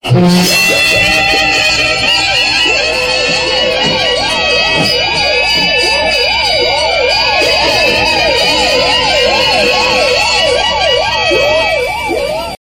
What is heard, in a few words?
win alarm